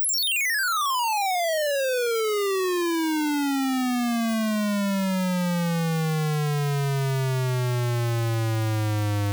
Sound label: level test 5-bit